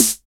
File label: snare boing; Roland L-CDX-01; Snares 6; Sn Modules 6